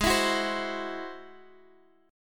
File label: Ab Augmented 7th, Ab+7 chord, guitar; strummed